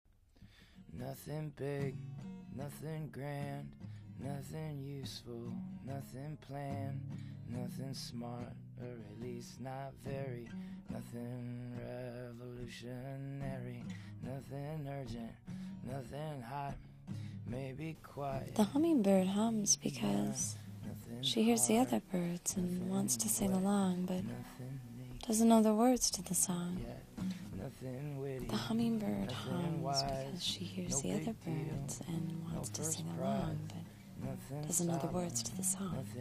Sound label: Humm